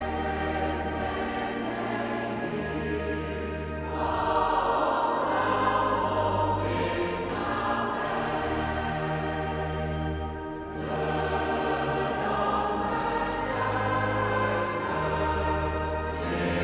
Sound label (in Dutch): Koor gemengd; de Bazuin